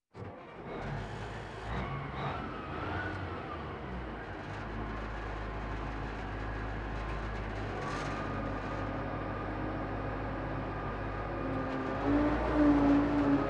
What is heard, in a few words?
Military vehicles